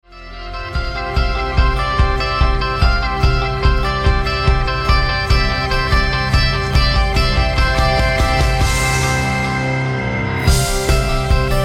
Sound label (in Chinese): 激情的背景音乐